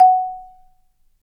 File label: Marimba; Roland LCDP11; Africa VOL-1; Af.Marimbas